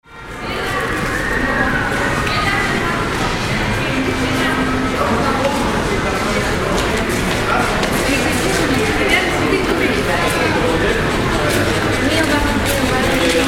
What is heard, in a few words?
Supermarket; TreasureIsland